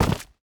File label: Soldier Footstep Rough Terrain